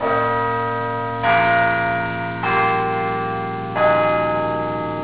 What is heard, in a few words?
carillons, bell; arlington